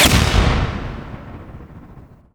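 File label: explosion generic medium; props; explosions; Portal 2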